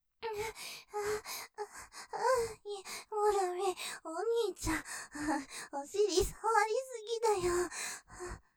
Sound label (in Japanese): 喘ぎ ふやふやに感じちゃう; お尻いじいじ; ステレオ